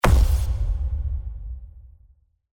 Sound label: Epic Hit; افکت صدای ضربه سینمایی